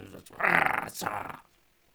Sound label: Mustache Sprout Amb Vocal; Friendly; Sproutfolk; Fey Hub Sprout